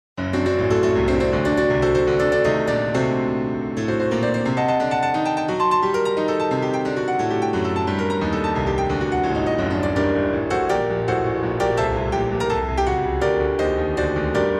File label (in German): Solo Klavier nach romantischer Art